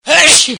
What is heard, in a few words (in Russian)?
chihane